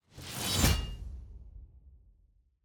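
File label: sfx celebrate notification intro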